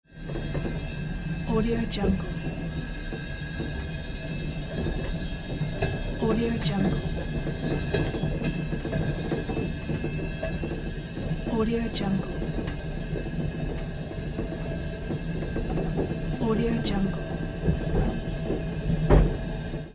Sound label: train knocking wheels sound inside; jdproduction